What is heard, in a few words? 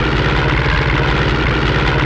air; Mafie 1